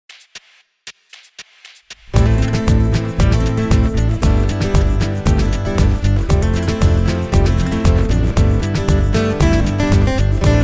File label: esp ltd tl series; demo track